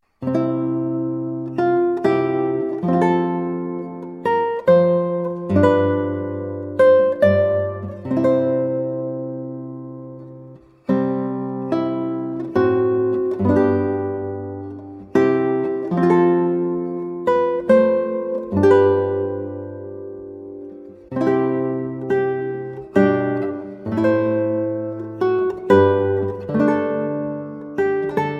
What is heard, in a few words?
8 String Guitar; Dowland; Tarleton's Riserrectione